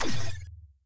default beep

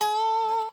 Windows Ding

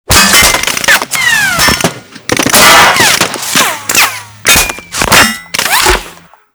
Hedge Impacts

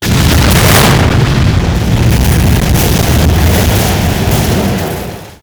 Explo Hallway

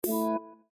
windows balloon

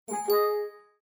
Windows Exclamation

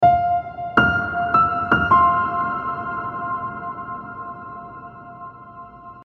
DreamGrand

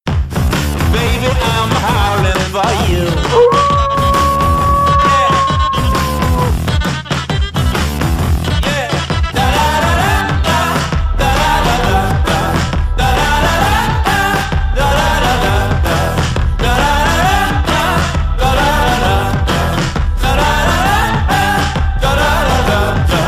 Goal Song; Arizona Coyotes

